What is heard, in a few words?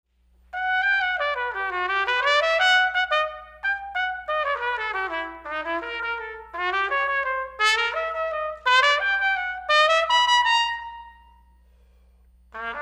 trumpet